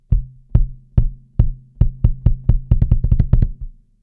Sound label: Kick